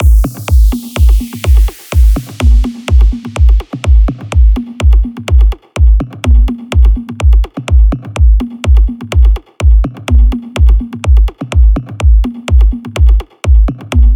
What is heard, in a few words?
Electro Acid Rain Mix